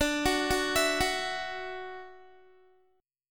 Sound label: D-Major Add 9th D down Guitar; Dadd9; arpeggiated